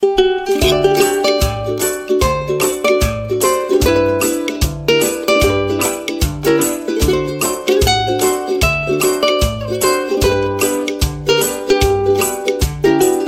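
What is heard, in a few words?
country ukulele ringtone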